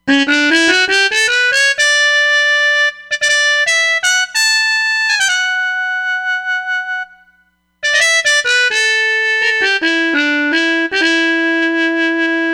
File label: Harmon Mute, Roland Fantom XR